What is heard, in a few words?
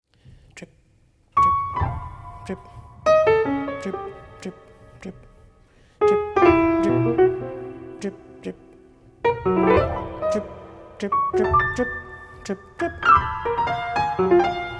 drip